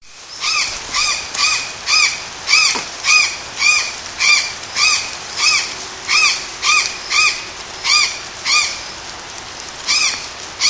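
Cyanocorax caerulea, Parque Ferradura, Canela, Brazil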